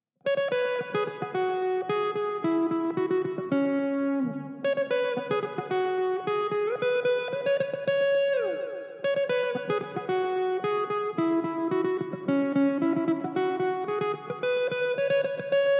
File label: 110bpm GuitarRiff F m